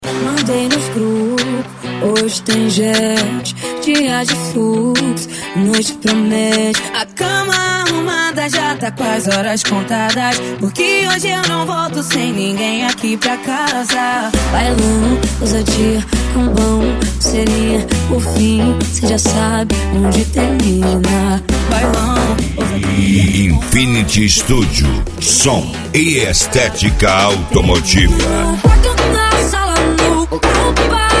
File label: EletroFunk Funk; Infinity Studio 2024